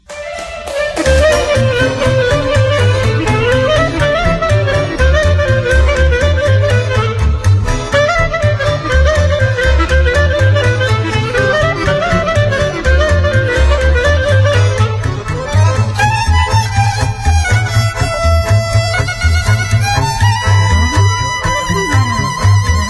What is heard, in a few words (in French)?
Musique Rythme and Roms